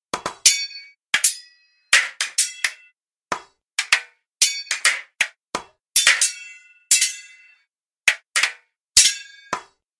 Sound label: Weapon and shield; SyrinScape; Iris hill manor